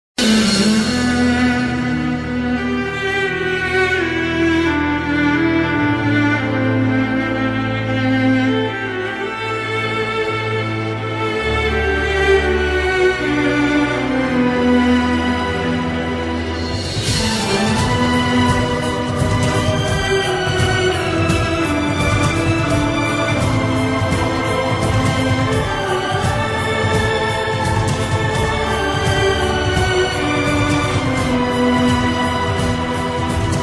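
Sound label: Sad background Music; Tv Serials; Star Plus; Ek Hasina Thi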